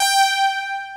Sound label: POLY KEYS; Pro-Rec - Synthsonic